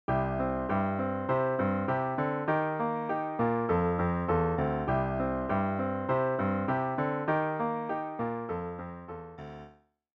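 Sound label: vamp, piano rendition of the chords, C Eb F; song intro; 3-Chord Vamps; start by holding the first chord for 4 beats, with the next two chords held for 2 beats each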